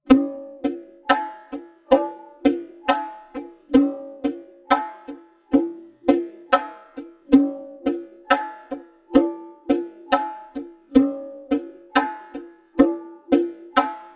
Bongo

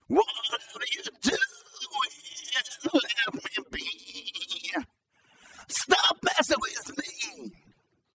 Singing Voice

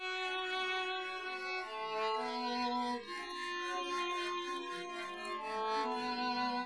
Castanets Violin Applause

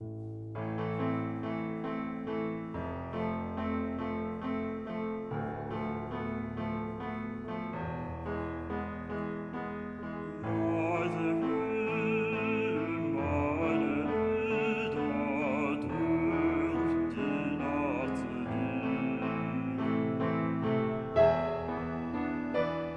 Sync Wavetable Soni

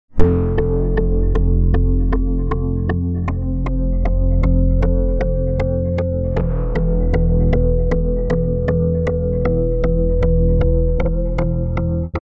Synth Poly

